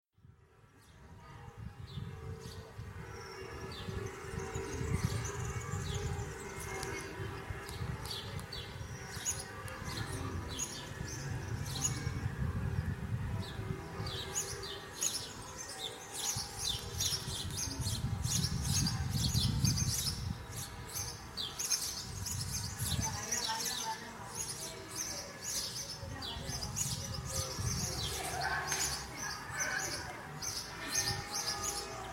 los pájaros cantando y las campanas sonando, Murcia

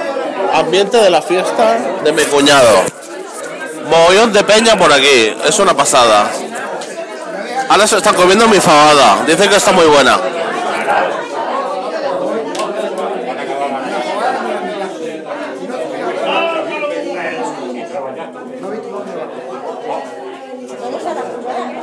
fiesta por todo lo alto

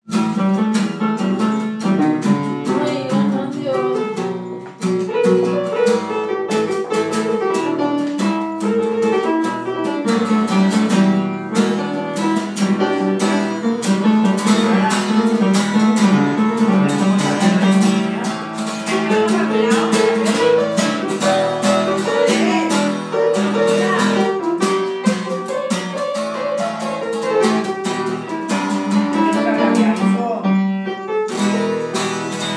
al piano y a la guitarra

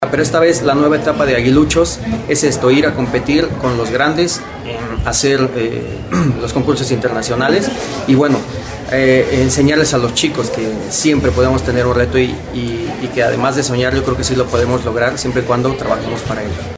marching band; guiluchos; xvii festival internacional de bandas de musica

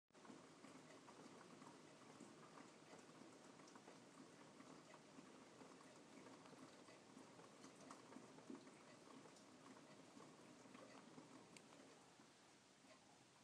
lluvia nocturna

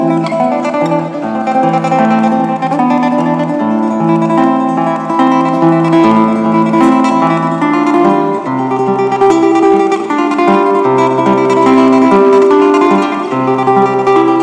domingos de tango en la plaza dorrego

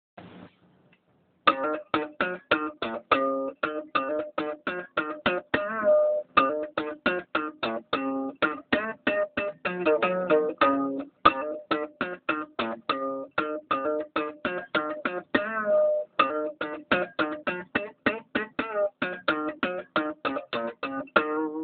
improvisacion en la guitarra

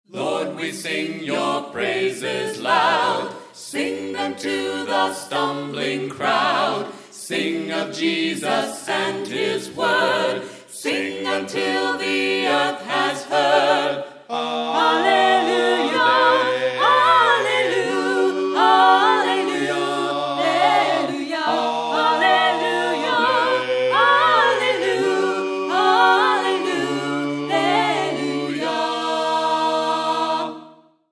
aleluya en ingles version 2 singers

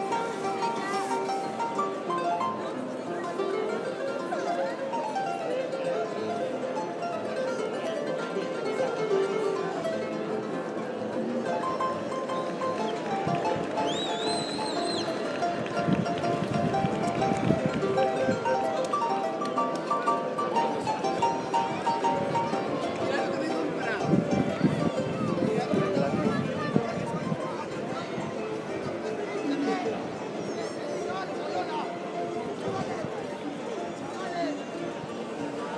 paisaje sonoro pl mayor arpista showman acordeonista vendedor de chucherias

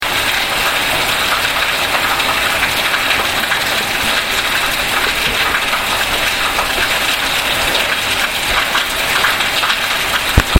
lloviendo fuerte

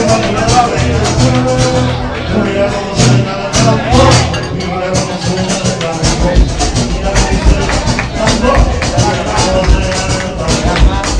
flamenco andalus

